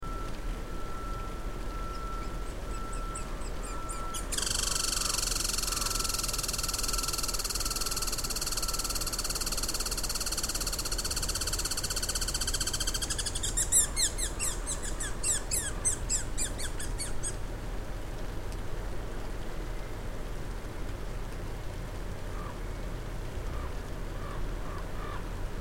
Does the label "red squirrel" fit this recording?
Yes